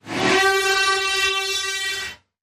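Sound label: Creature Poltergeist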